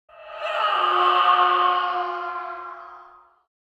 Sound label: Ghost screaming